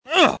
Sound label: grunt